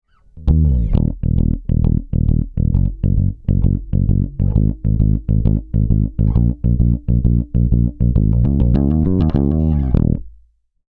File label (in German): Bassline, EQ, Komp; Bassline 3 Britstrip